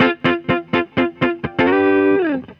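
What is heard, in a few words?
guitar, Roots Disco; USB Soundscan vol.32; AKAI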